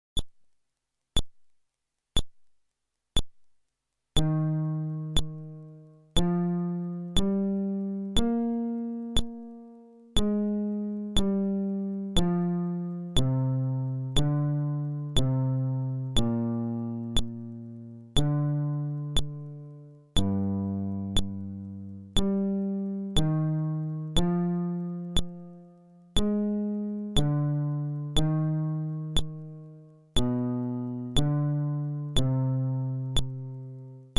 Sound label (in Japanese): フレットレス